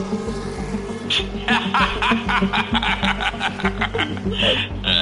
risada

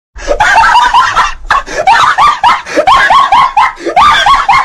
cachorro rindo

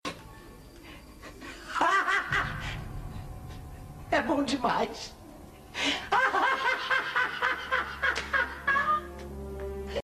risada; nazare e bom demais

